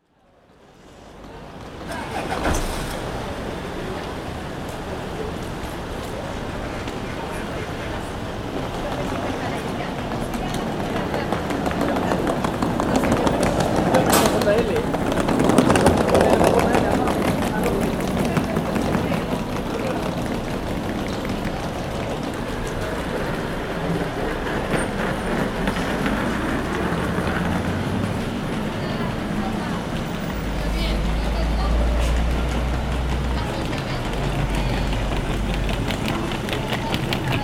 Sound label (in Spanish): Exterior Estacion Bus